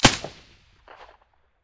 silencer; S.T.A.L.K.E.R.; IWP; vityaz; pp1901

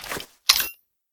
echo draw, detectors; STALKER; IWP

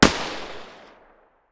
ump45 muzzle mono far

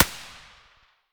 aps muzzle far; STALKER; IWP

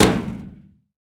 bolt metal, material; STALKER; IWP

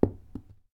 shell 39mm carpet; STALKER; IWP

